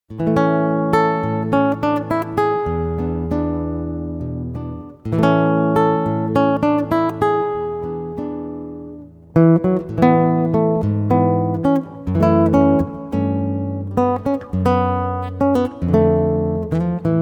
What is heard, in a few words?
Guitar Solo